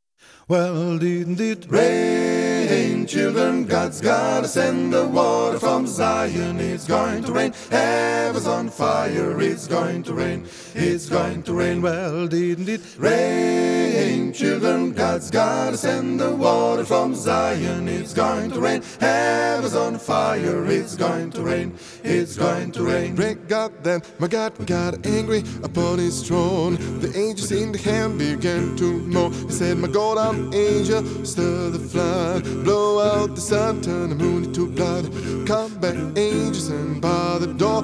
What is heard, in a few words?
Lexicon PCM91, reverb test; Posentek z Lexicon PCM91; TC M3000 vs. LEXICON PCM91